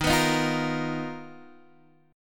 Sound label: B Major 7th Flat 5th D sharp, chord shape